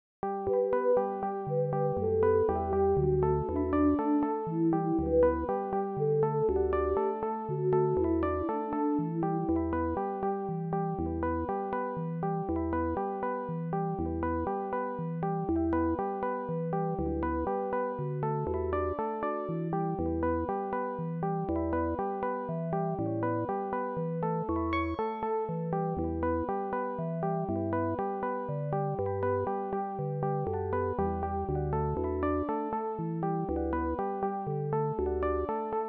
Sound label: midi playback; scarbro